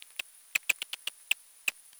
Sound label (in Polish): mroczek pozny, chiroptera